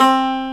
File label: banjo c4; CLARITY